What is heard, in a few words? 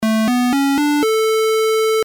square